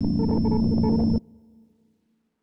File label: low drone; ゆめ2っき